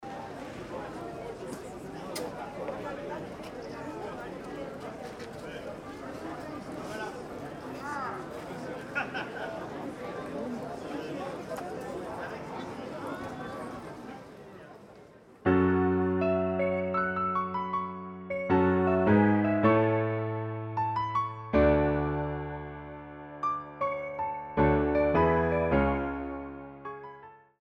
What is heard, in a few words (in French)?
SANS VOIX